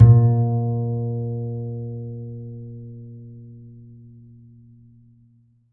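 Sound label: double bass A#1, Jazzistic; Univers Sons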